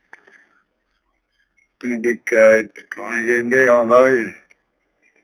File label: ceremony